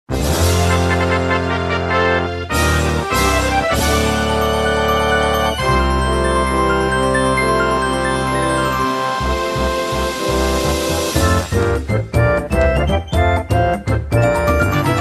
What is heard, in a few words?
Dream Country